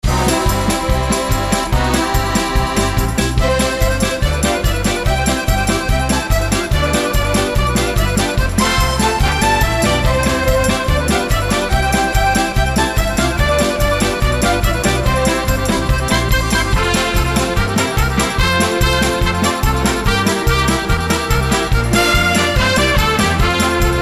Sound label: Crazy Accordeon